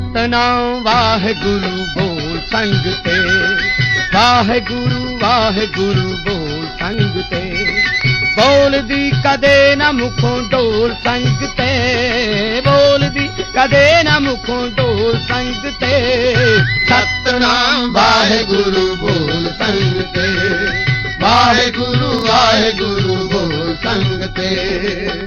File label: Satnaam Waheguru Bol, Gurbani Ringtones